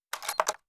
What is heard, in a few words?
revolver fast reload, cylinder